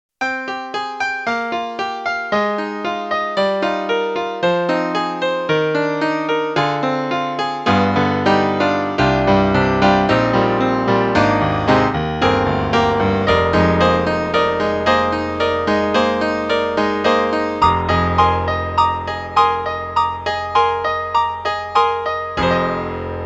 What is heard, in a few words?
Pop Rock Grand Piano; E-MU; Proteus1